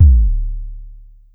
Drum X Sub, Pearl Drum-X

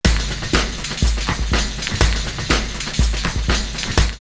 Soggy Loop 122, drumloops OldSchool; X-Static Goldmine

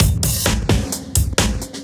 Beat Style, 130bpm, breaks

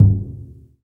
PRC ORCH, Orch Bs Drum

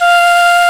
Nye Flute F4; Planet Earth; Digital Sound Factory Planet Earth

